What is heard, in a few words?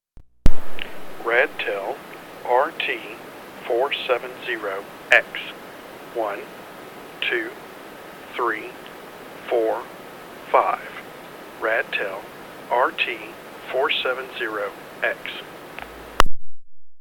radtel rt470x